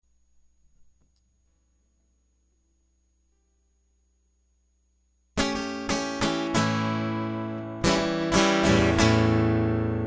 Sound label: Guitar Loop